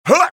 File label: taunt exert; tf2; demo